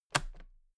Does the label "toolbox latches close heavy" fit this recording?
Yes